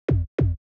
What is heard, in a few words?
kicks